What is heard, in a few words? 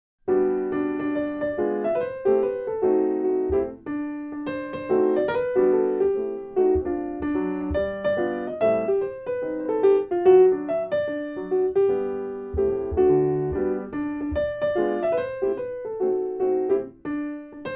wedding piano